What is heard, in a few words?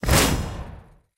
streak mission complete; War Thunder